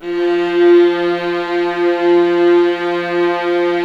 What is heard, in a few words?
F1, Ultimate String Collection, VLAS EXP; LEG